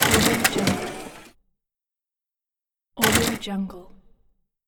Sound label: user interface mechanical selection